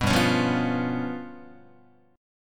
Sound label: G 7th Flat 5th G down Guitar; strummed; JGuitar